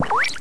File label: push